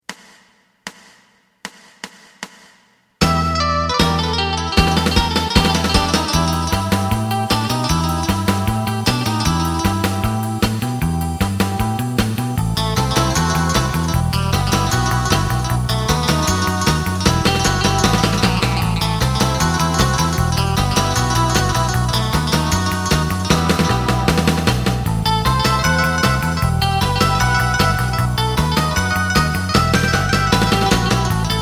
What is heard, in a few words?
two guitars